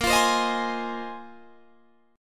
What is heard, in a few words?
A sharp 7th Suspended 2nd Suspended 4th, Guitar Drop D chord; down